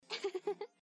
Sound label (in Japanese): 笑い